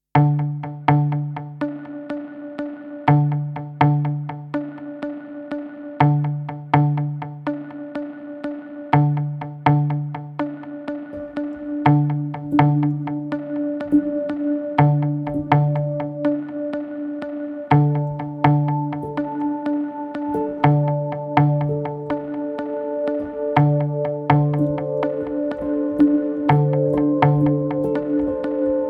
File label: Tension Slow